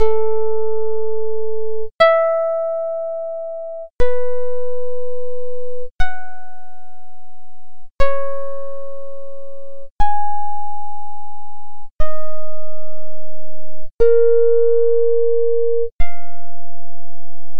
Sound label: tuning 12 edo chain of 5ths